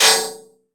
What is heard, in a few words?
multimedia rollover; sound effects one